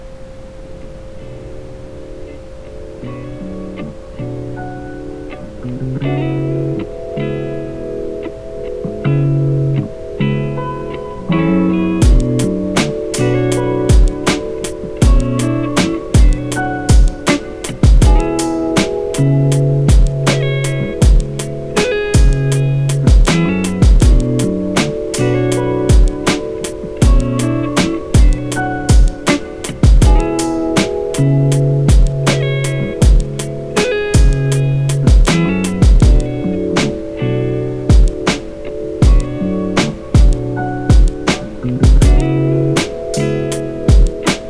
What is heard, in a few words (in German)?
mit Ambient Sound; chakren; Manipura Kraft